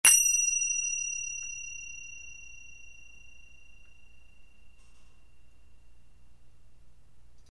FX Finger Cymbals Thin

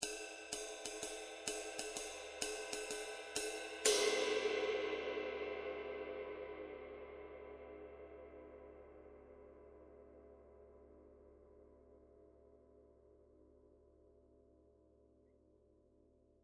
22in Custom High Definition Ride

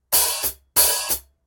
13in Pocket Hats, cymbal

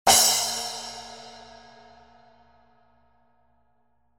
12in Splash, cymbal sound

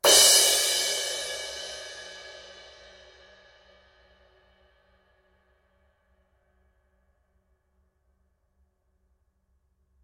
18in A Medium Thin Crash, cymbal sound

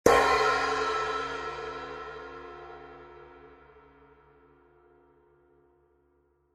15in Latin Multi Crash Hand Stick

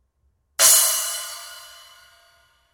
10in Trashformer, cymbal; ZXT

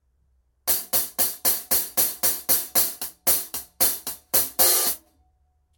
16in Light HiHats, cymbal

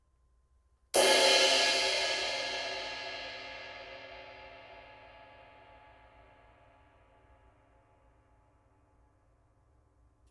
22in Light Ride

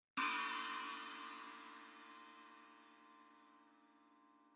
18 I China bow, cymbal sound

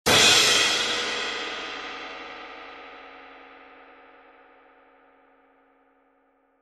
20in Crash of Doom, cymbal sound

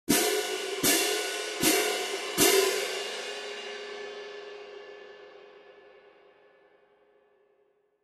20in Concert Stage Pair, cymbal sound

